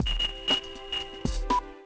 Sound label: Siemens on; mobil